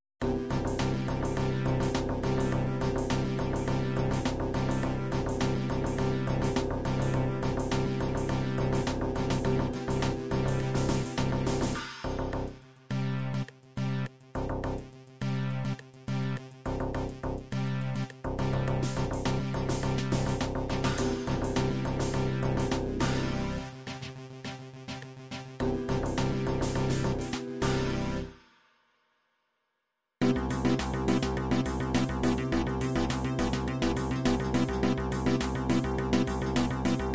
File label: techno, midi